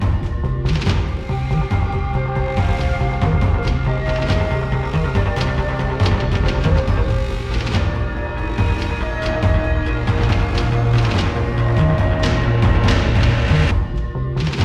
slow; yuttari sound

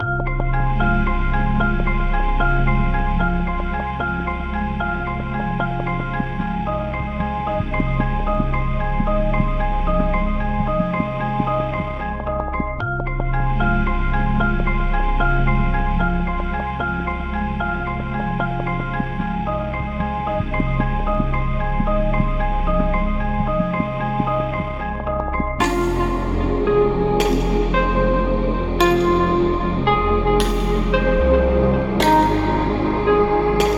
hiphop; dark sound

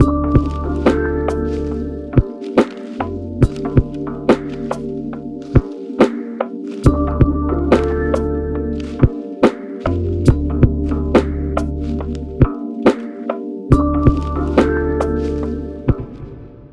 Bollywood Beatscape; yuttari

